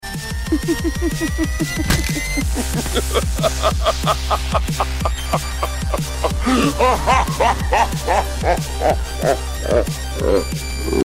nefarious laugh

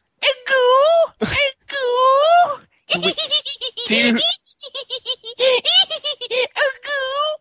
uguu

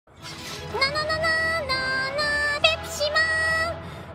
fanfare; fubuki

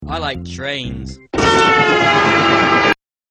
trains

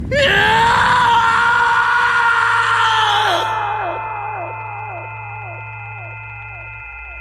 screaming

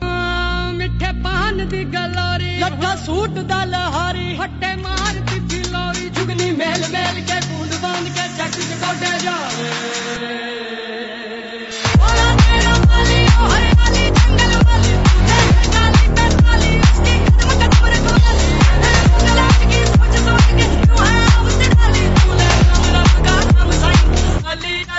indian vibe

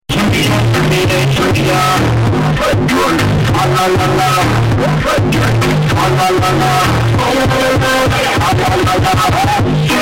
bass boosted

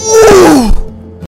ouuuuu

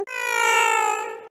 rock pikmin death

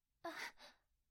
uh female surprised gasp

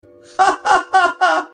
hahahahahahahha

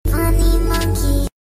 funny monkey

